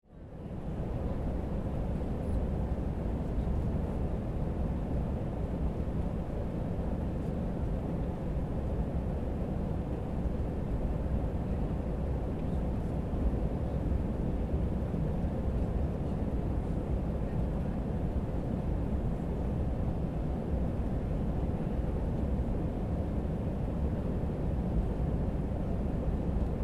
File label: Airplane Landing, Transportation, Aircraft, Airplane, Landing, Interior, Perspective, Commercial, Airliner